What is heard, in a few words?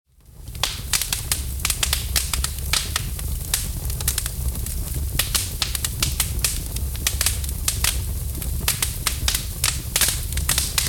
fire; blizzard